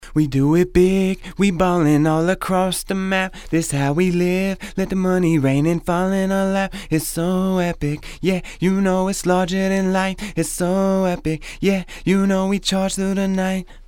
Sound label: dry vox